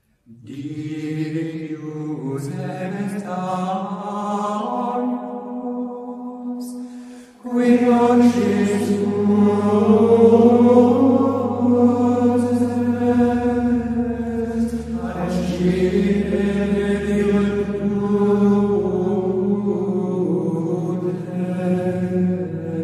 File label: Dignus est Agnus - Gregorian Chant; Oct fin Cristo Rey